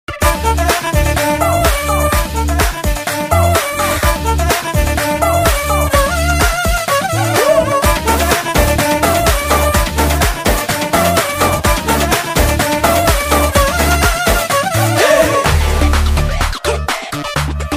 title song ringtone, telugu, love bgm

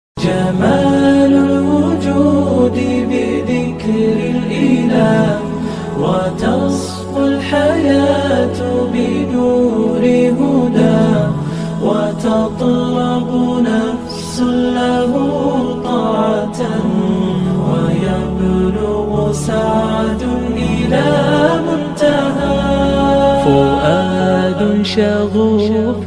arabic nasheed heart touching ringtone

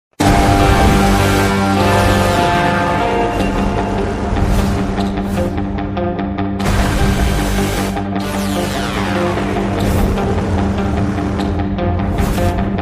love bgm ringtone telugu bgm ringtones; a1 express

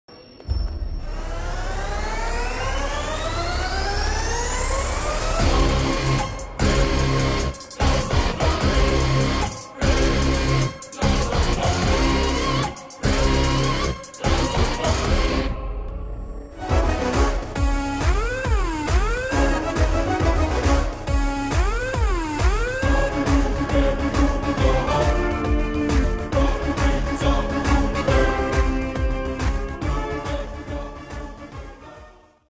entry background music background music ringtone; ram pothineni; the warrior

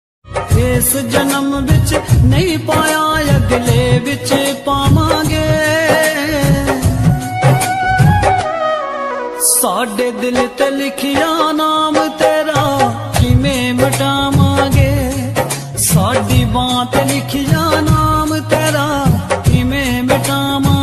hindi ringtone

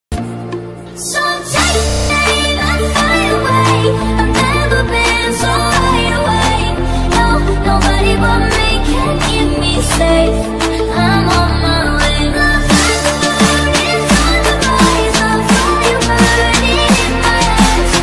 attitude ringtone bad boy

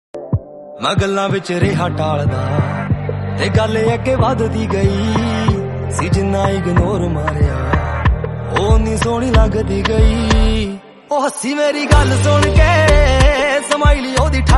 ringtone hindi romantic ringtone

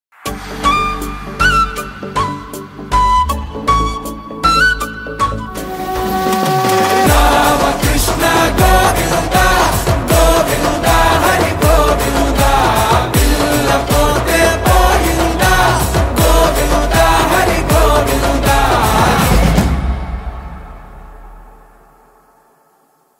ringtone song; rama krishna; surender reddy

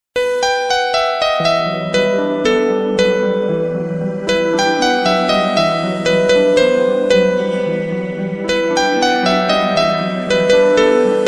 instrumental ringtone, instrumental song

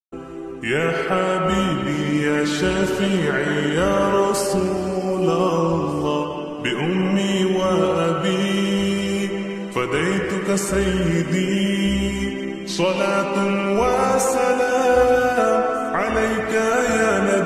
ringtone naat ringtone new naat; rahmatul lil alameen

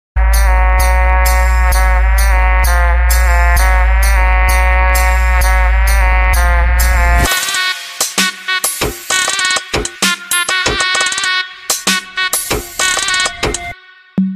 instrumental ringtone villain beats; mirzapur 2

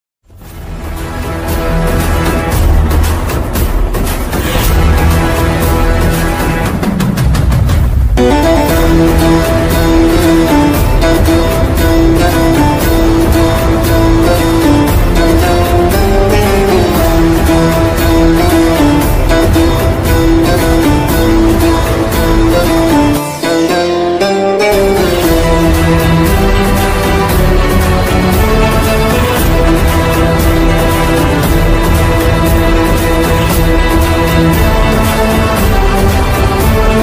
bgm ringtone entry bgm; KGF chapter; rocky entry

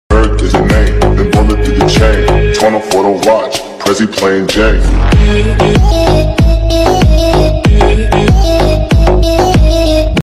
bass bgm sound mobile ringtone; attitude

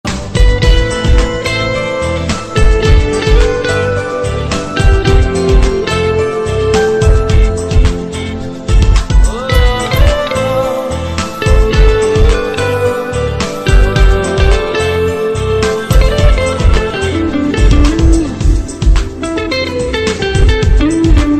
instrumental ringtone guitar, love song

